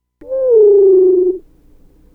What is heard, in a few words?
Enchophyllum, treehoppers; Cocroft